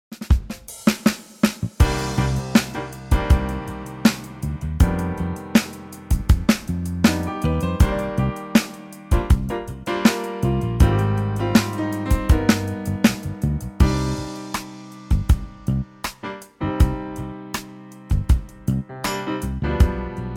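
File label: funky Trio Cm, male; key - Cm - vocal range - D to Ab; Superbly funky Trio arrangement; theme from the 70's classic movie "Love Story"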